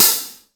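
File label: half open hi-hat; InVision Interactive; 40 Oz Phat Slammin Hip Hop; IV DRUM KITS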